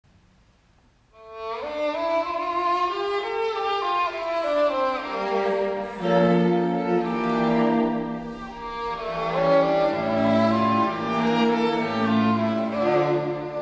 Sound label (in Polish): KWARTET Piosenki francuskie